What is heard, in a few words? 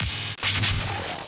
growl